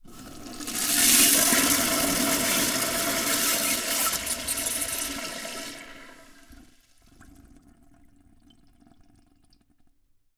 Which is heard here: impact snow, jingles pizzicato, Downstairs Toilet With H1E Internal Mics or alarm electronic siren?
Downstairs Toilet With H1E Internal Mics